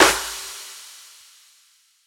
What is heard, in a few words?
Sizzle, Cymbals; Crashes & Cymbals